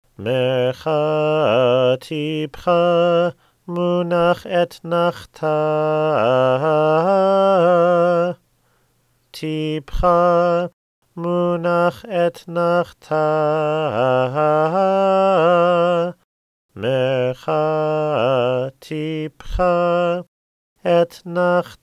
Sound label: Haftarah Trope Etnachta